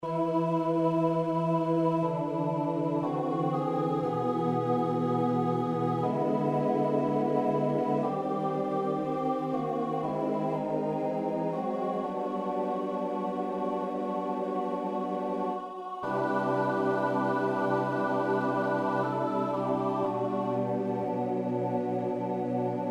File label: midi; Like as the waves